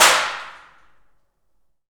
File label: PRC ALMWA, Aluminum Wall; Roland L-CD701; FX Perc 1